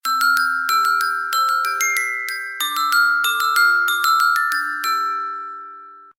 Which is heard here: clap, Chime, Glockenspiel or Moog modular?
Glockenspiel